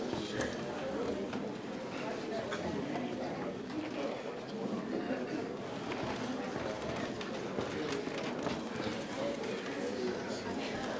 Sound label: courtroom amb loop; Film Noir SoundPad